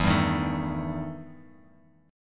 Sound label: E Minor Major 7th Double Flat 5th E Bass Standard; strummed; JGuitar